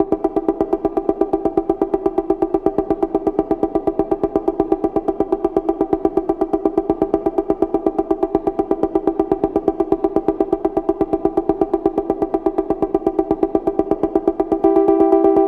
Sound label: melodic techno